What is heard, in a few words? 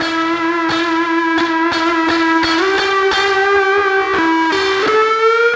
Guitar Lead; Trip-Hop; Loops; Sonic Foundry; Rondo Brothers Trip-Hop Loops & Samples